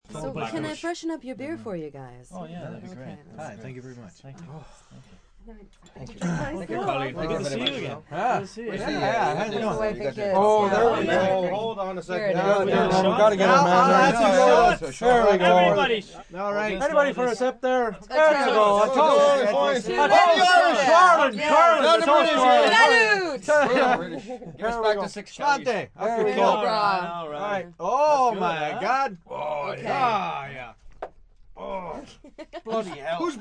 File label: Ambience Beer, Gathering, group of 5-10, walla, talking, gathered in a bar; Bars And Club; a little more rowdy and loud; VoiceBox